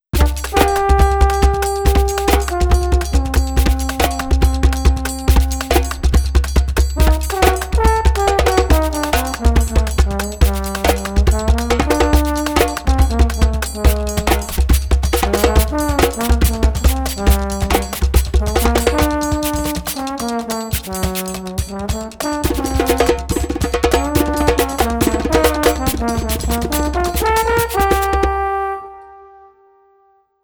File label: djembe; muletone audio